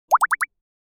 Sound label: Multiple pop